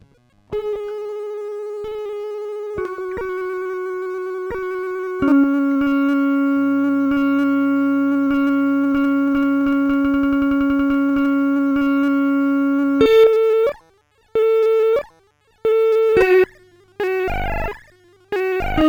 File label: timestretch guitar